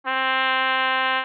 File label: trumpet